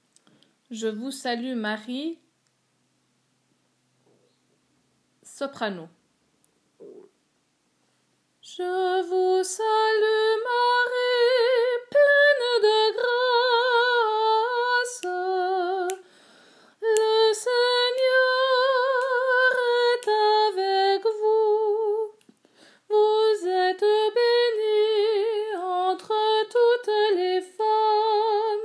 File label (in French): Je vous salue Marie Sop